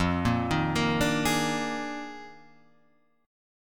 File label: D sharp Major 7th Suspended 2nd Suspended 4th F